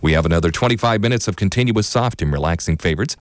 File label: soft and relaxing